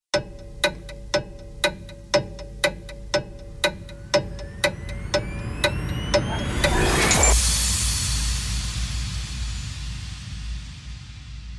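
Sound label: countdown before the start of a grandiose event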